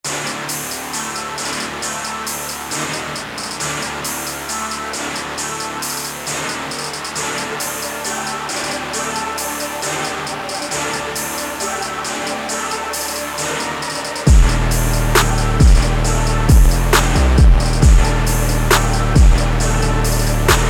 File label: Beat BPM 135